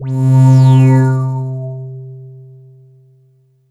Alesis S4 Plus Goblins C3

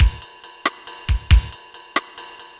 Roland D-20 Ballad 96 bpm

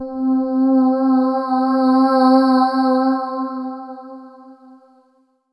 Yamaha TG500 CH Spirit C4

